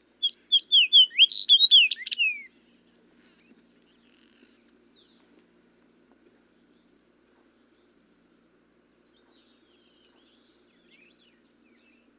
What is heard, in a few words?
Fox Sparrow